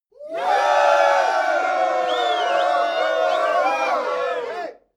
male crowd cheering short

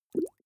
small bubbles single

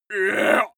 male shout hit

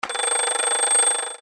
clock alarm

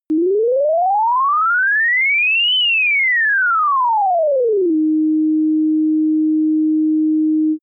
general rise and fall sine

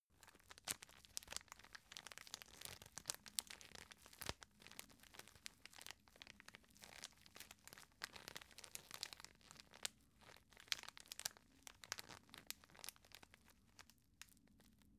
tissue package handle

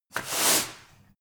broom sweep soft

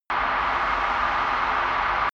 drone spaceship vent hard

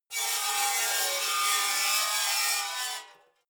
metal scrape rich slow

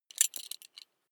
metal belt buckle clatter heavy

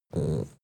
dog small growl very soft short

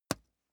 wood impact small lash

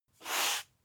broom sweep slow single

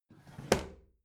bathroom drawer open regular

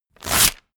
tape rewind

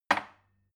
glass put down cupboard hard

